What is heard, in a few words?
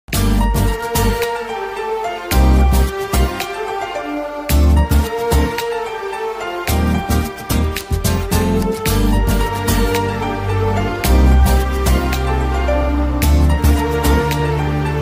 telugu love bgm ringtone